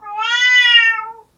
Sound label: cat